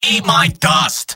scout battle cry; vo; tf2; mvm

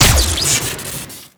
fire crit, weapons; man melter; garrysmod; tf2

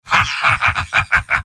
m laugh happy; soldier; mvm

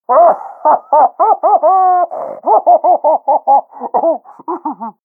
laugh; pyro; Garry's Mod; tf2 content

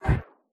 crouch down; boxy boo v2

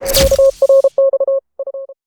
saved; tf2; garrysmod

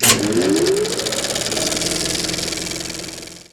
starting upload; replay; youtube; tf2; garrysmod

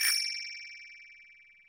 item acquired

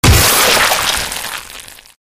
grinder human, ambient; Garry's Mod; tf2

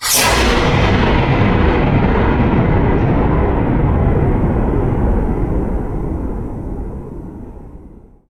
thunder, halloween ambient; Garry's Mod; tf2

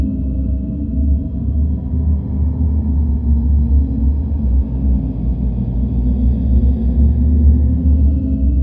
underground wind lp, ambient; Garry's Mod; tf2

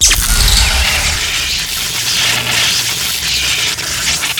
weapon crit charged on; tf2

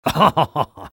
laugh short; spy

